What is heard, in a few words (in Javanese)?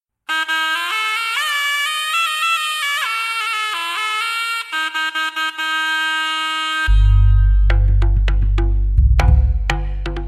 Slompret Jaranan